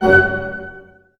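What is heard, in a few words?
staccato F#, orchestra; Sound On Sound - Peter Siedleczek's Orchestra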